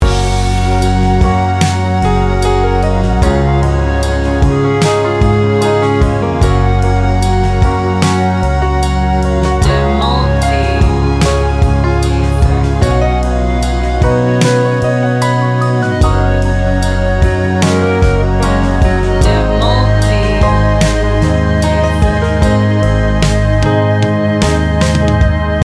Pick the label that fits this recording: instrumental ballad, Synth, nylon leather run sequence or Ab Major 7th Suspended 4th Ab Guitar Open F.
instrumental ballad